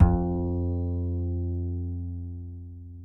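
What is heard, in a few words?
acoustic bass soft F 2; Masterbits CLIMAX 7 - Bass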